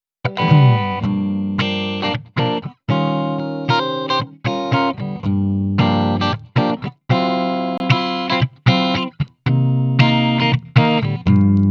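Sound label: Electric Guitar Clean; ElectricGtr Clean; Solid State Logic 611EQ serie API-500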